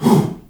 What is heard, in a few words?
"Hoo", Shout; Spectrasonics; Heart of Africa 1